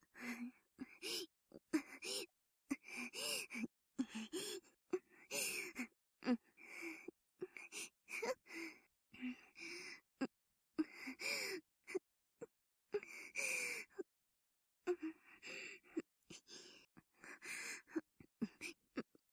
真緒息遣い（効果音なし・左立体版）, ASMR

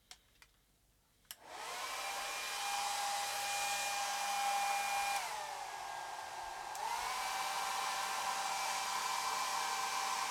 ドライヤー

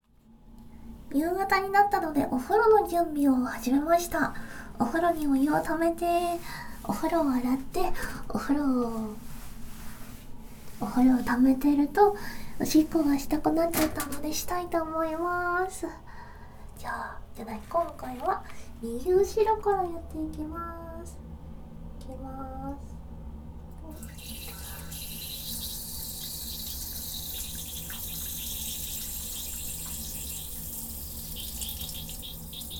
おしっこです！

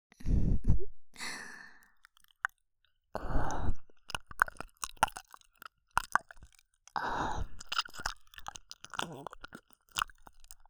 左耳舐め; 異界魔聞録～廃人へ堕つる夜伽話～; 舐め音

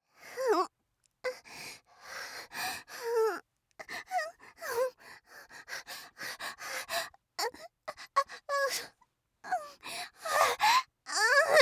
喘ぎ(弱～中); おまけBGV

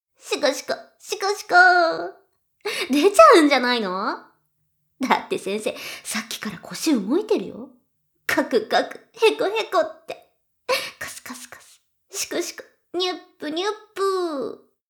しこしこ音声; 援交JK魔法少女マスカリチェリー

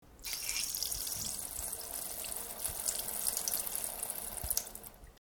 おしっこ; しょうしょーおしっこ 約5秒

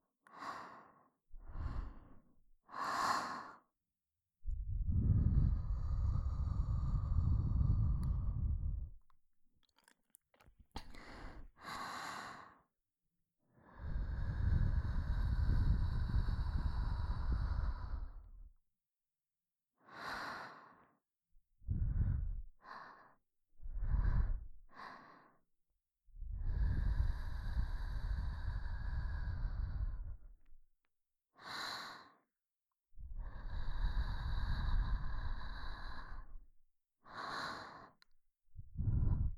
ふー…ふー…, ASMR

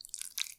浅めにストローク, ピストン運動 激しめ

